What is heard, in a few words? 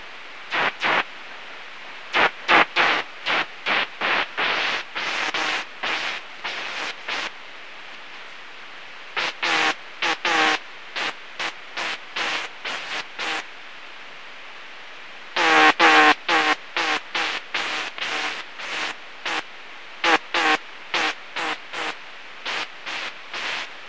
Pseudoips prasinana HETERODYNE 41kHz; Croze19; M-Barataud